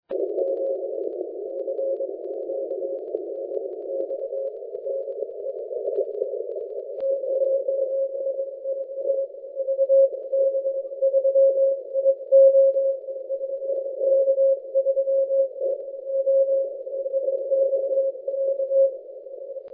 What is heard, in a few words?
80m cw